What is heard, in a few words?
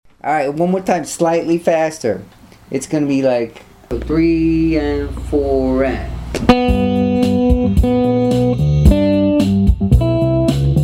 blues play along slow; hal leonard